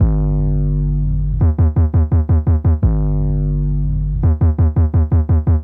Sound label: Hammer Bass, Drum & Bass; Fluid Dynamics